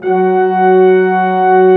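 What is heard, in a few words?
Brass Ensemble long; Roland L-CD702; Lrg.Ensemble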